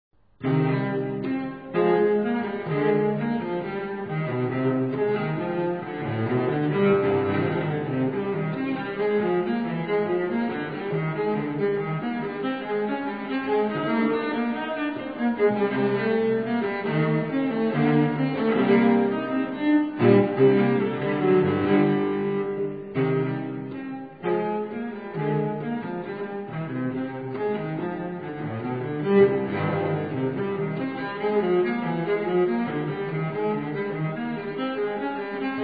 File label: Suite nr.5 in C. Minor, BWV 1011; tortelier; Suite 5, BWV 1011, Bourrée I; EMI Records